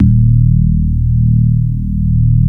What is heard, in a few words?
DX PIANO; East West; Twisted Textures-BT